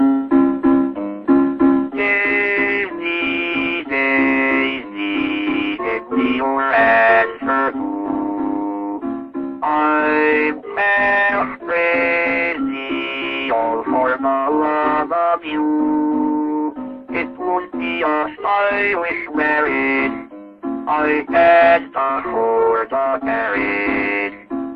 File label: daisy bell, IBM 704; bell labs